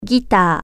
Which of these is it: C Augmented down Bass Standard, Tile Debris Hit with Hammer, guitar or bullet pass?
guitar